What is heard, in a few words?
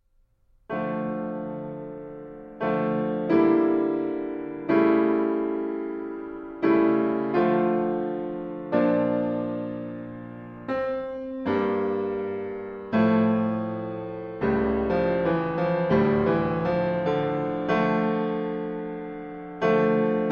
Partita For Violin Solo No2 In D Minor Transcribed for Piano; BWV 1004; Ferruccio Busoni